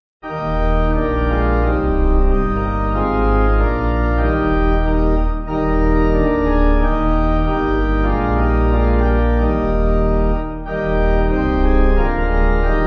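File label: Pipe, Pipe Organ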